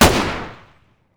m9 fire, weapons pistol beretta92fs; garrysmod

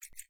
m60 feeding mechanism; mg; garrysmod

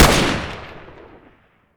m14 fire, weapons; garrysmod

